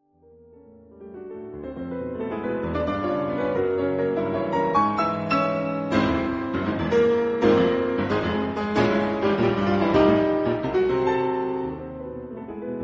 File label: Piano Sonata in B flat minor; Piano Sonata No.2 in B flat minor , Op.35; 蕭邦